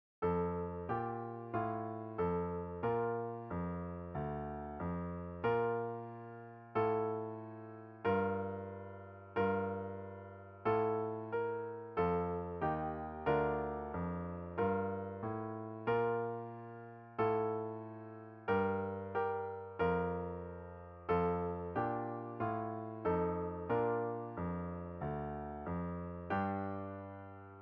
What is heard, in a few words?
Solo 7 for F Horn; Solo Seven, F Brass; Development Band Tuition